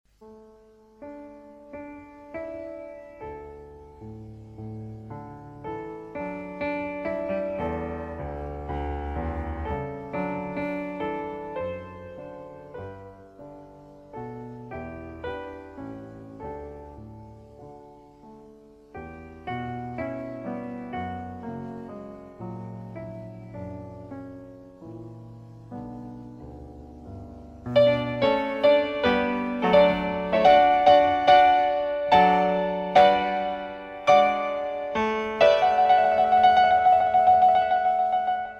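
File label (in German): Klavierstücke Quasi allegretto; Heinrich Schenker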